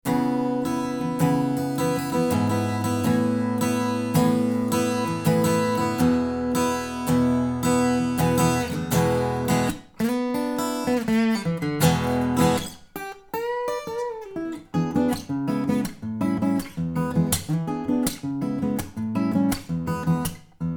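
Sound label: Distance Ac Gtr Far, acoustic guitar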